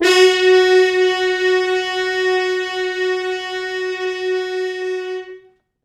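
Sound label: French Horns stops, F#3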